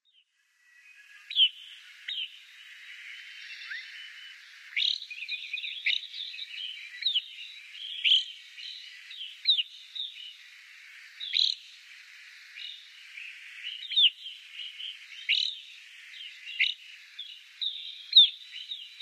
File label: Evening Grosbeak calls